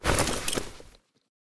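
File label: inventory outfit off; STALKER; IWP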